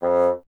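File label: wind bassoon F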